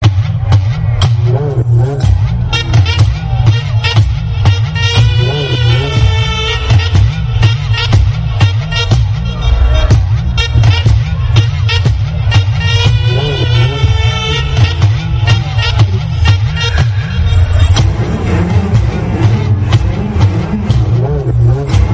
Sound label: bgm tamil; saaho